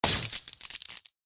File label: Drone; R6Siege